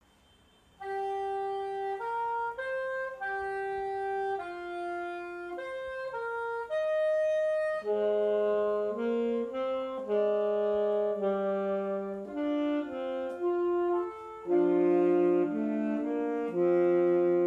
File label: Saxophone Quartet